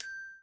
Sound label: bell